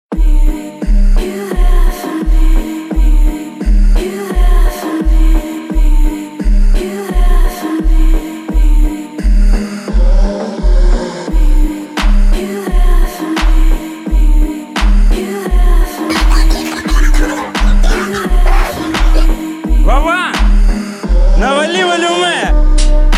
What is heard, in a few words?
33-37 hz Low Bass